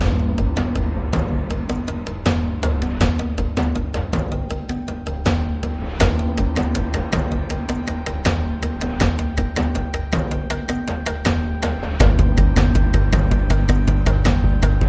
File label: Perc and Pulses Only